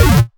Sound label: Misc Short FX